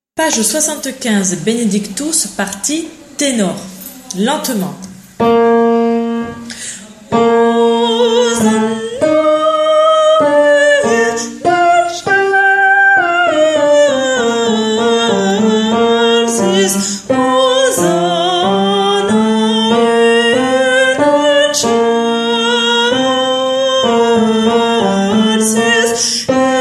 Requiem Benedictus Tenor; Mozart

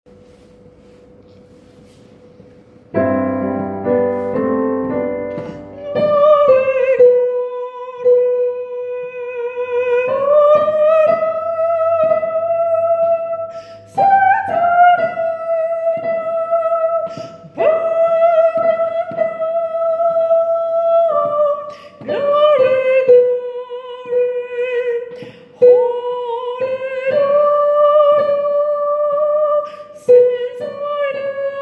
Tenor

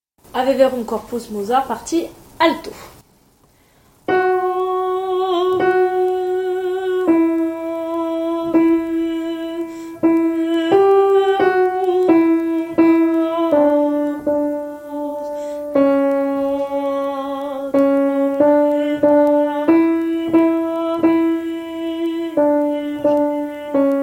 Mozart Alto; Ave Verum

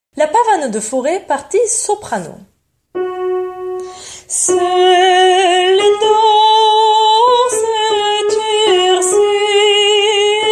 Soprano; Pavane; Faure

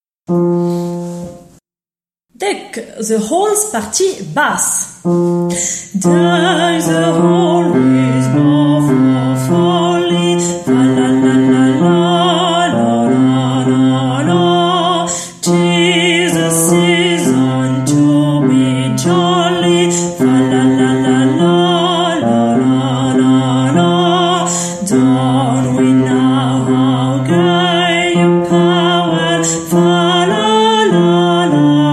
Deck The Halls Traditional Basse

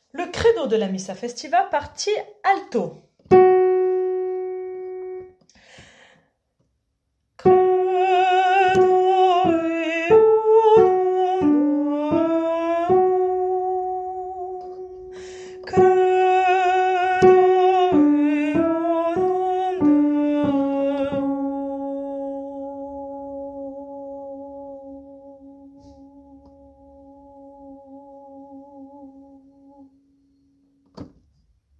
Credo Missa Alto

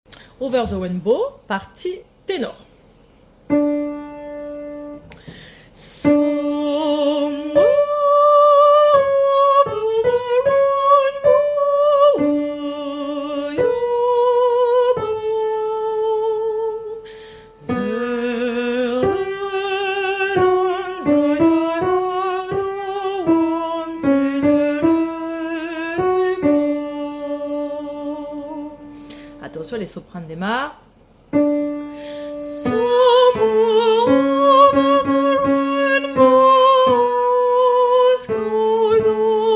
Tenor

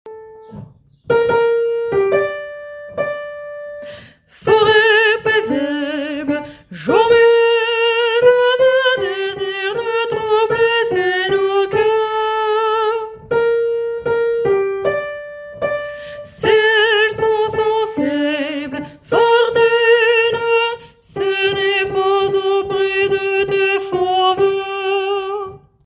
alto; Les indes galantes

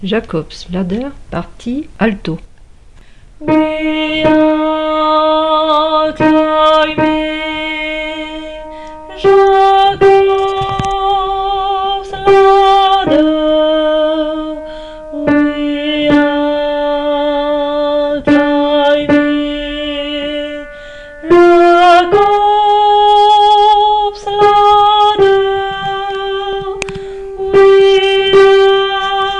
Alto; Jacobs Ladder

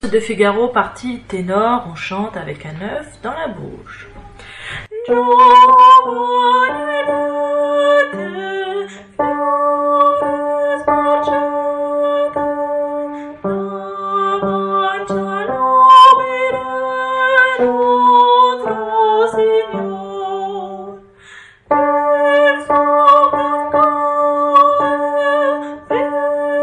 Tenor; Giovani Liete; Mozart

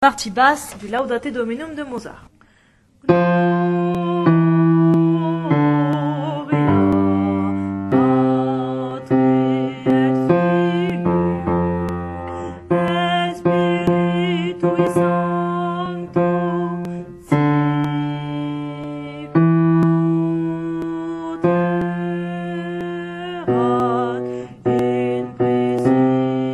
Laudate Dominum Basse; Mozart

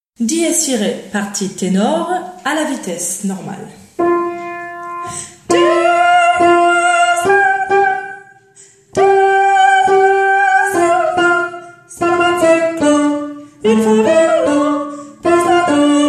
Requiem Dies Irae Tenor; Requiem 31; Mozart

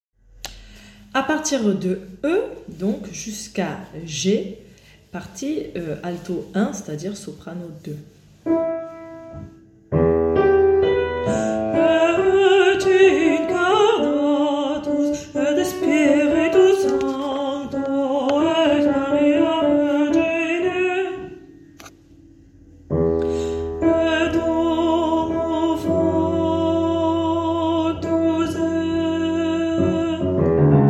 soprano 2; Credo E à G

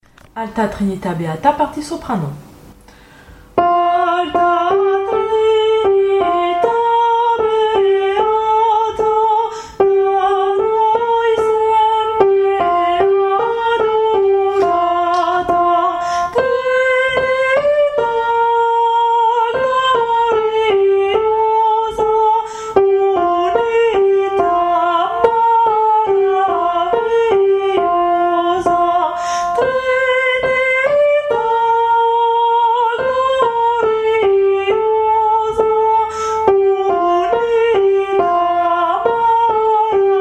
Soprano; Alta Trinita Beata; anomyme XVs

